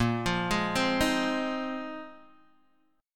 Bb 7th Suspended 2nd Suspended 4th, Guitar, Open G